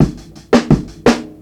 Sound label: 84bpm, Hip-Hop Street Beats; Jamaica; Hip-Hop Street Beats Construction Kit; Street Beats by Poogie Bell